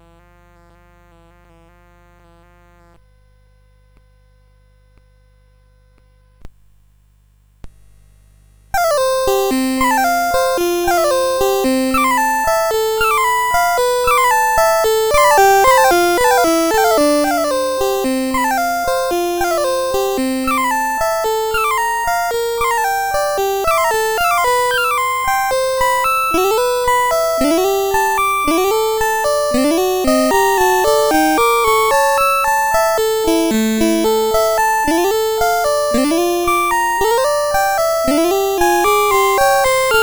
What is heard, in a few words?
CSG8580; CSG8580R5